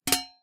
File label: hit Helmet, Swords; Foley; Kenney's Sound Pack